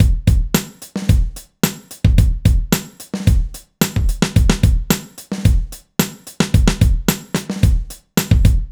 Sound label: Drum Break; Sonic Foundry (Sony Creative Software); Rondo Brothers Trip-Hop Loops & Samples; Apologists